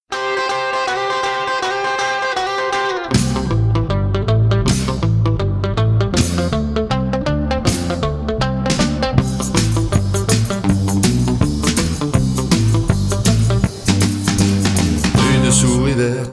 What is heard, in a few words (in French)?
Rock